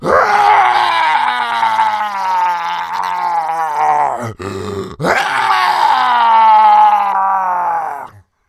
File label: zombie pain